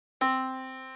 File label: yamaha Disk